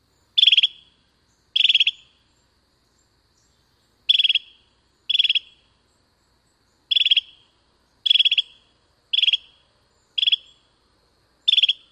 rattled dits

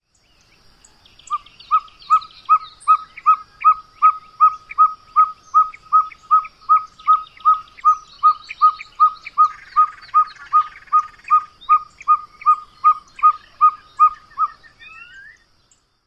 tooting song

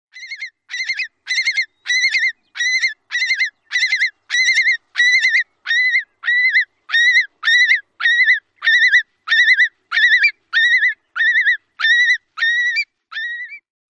alarm calls

